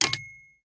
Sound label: T Shirt Cannon Reload